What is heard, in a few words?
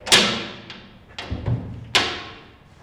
Jail Door Open; jail & prison doors